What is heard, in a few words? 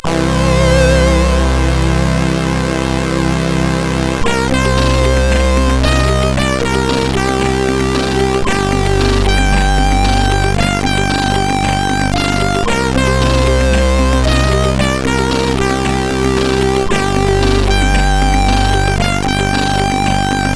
E-Load loader, CSG8580R5; hvsc